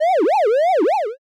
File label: spin